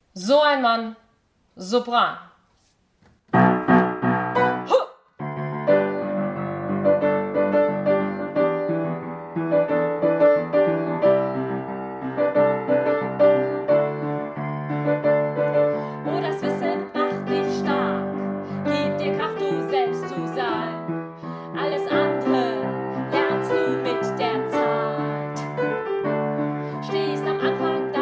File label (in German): Sopran; Tarzan